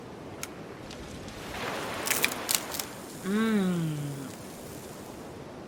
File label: eating crab; return to mysterious island